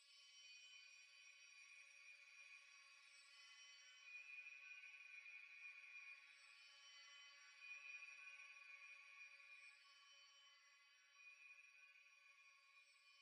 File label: Mist Ambience, music